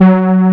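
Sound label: brass G2, SYNTHESIZER analog brass; AKAI S6000 CD-ROM - Volume 1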